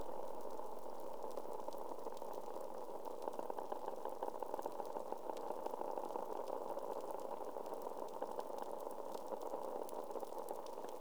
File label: Offreef Sound Example